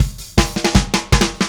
Happy Pub Beat Fill A; Sonic Foundry (Sony Creative Software); Indie Rock Anthems; Session 05 - Happy Pub

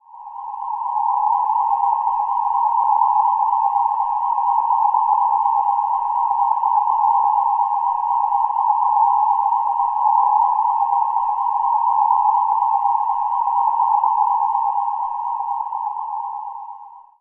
Arctic Breeze, Ambient; Zero-G Seismic Frequencies; 90 s Sample CDs

